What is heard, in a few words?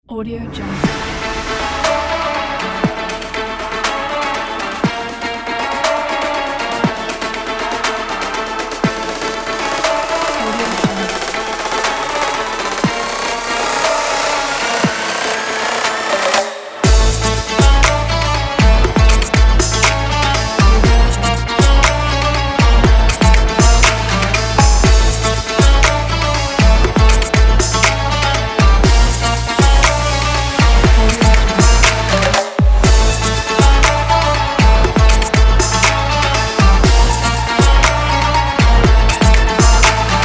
modern arabic